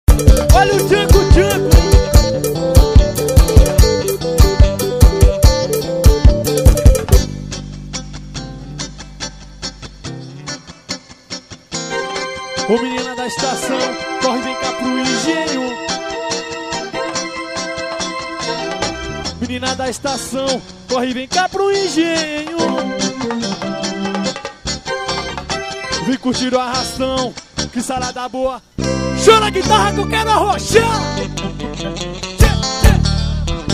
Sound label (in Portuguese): samba de roda